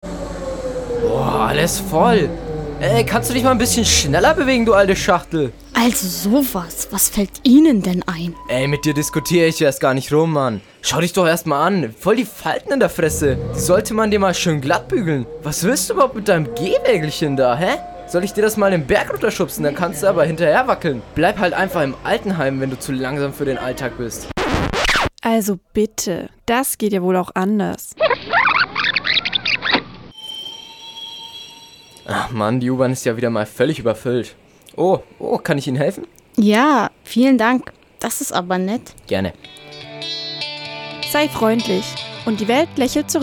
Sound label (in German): Toleranz-Jingle